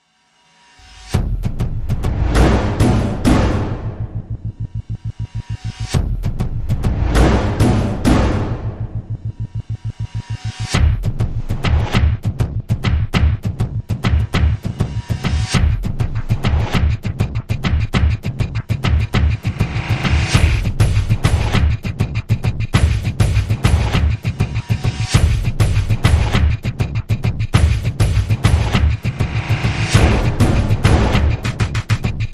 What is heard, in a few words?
Drums; Slammer